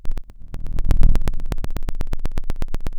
pop, Sound of a fire crackling

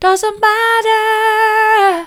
doesn't matter soft, vocal

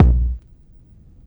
Vinyl Kick, drum

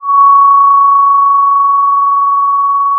pop, Sound of a phone ringing

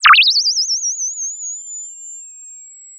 Sound of a bird tweeting nicely

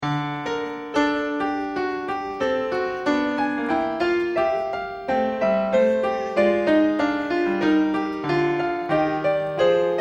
short piano mono

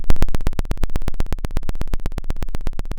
Sound of a drum roll

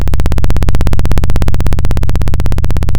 Sound of a plane taking off

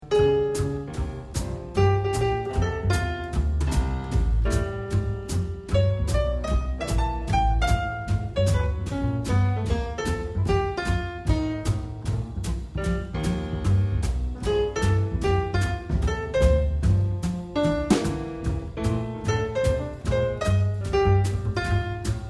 impro mono

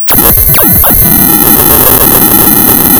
pop, tearing paper

